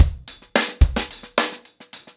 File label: Hip Hop 110BPM, acoustic drums drumloops